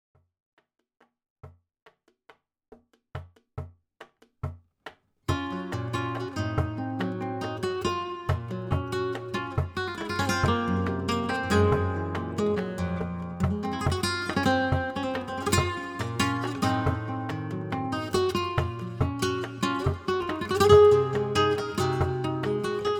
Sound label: falseta petenera x solea, flamenco